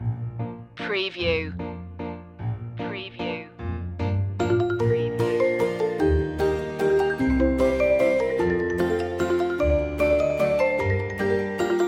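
we wish you a merry christmas orch loop long, Christmas Traditional We Wish You a Merry Christmas Orchestral; media music now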